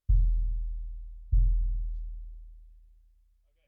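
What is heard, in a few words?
Bass Drum In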